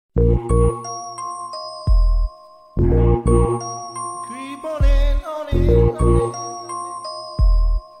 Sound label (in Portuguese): abertura